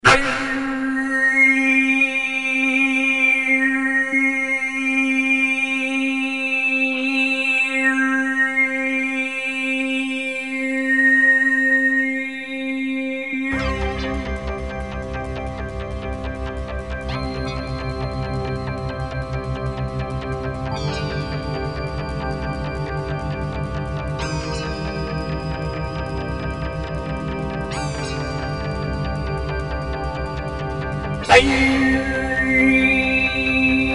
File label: drone